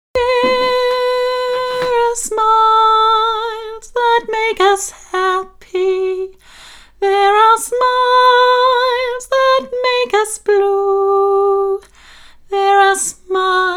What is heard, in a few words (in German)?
nur Basslinie allein